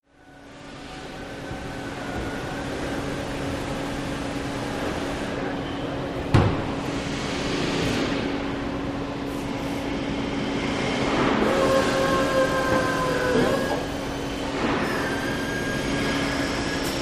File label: Assembly Area Grind, Assembly Area Riveting Grinders Spot Welders